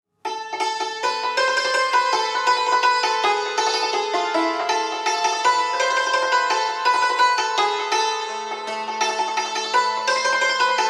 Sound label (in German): Chahar Nezrab Improvisation am Santur; Geschichten aus aller Welt